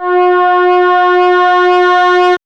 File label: MOOG; AMG - Vince Clarke Lucky Bastard